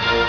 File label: SYMPHONY; Canon CameraWindow